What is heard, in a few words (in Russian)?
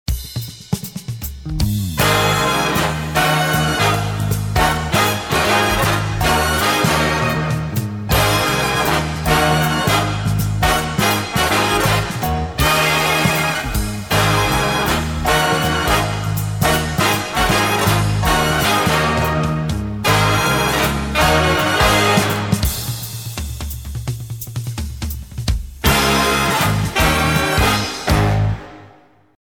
fanfaryi dzhaz